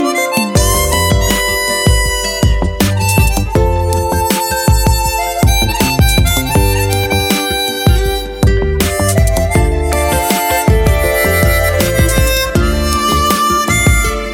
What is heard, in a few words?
saxophone